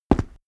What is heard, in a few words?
step stone walk; schritte